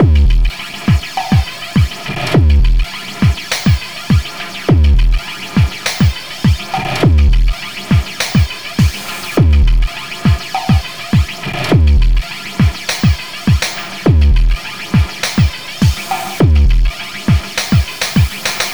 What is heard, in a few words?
Sky Lab Beat, Processed Drumkits